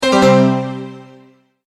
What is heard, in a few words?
multimedia tone, Goodbye